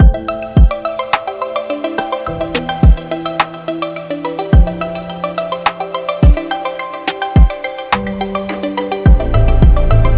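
Technology Science Loop, Modern technology background music; hi tech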